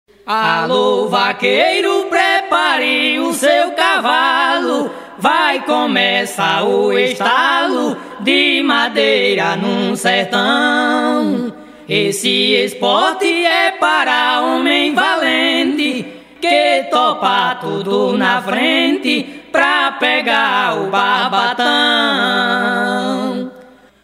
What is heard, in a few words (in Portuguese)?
toada estralo de madeira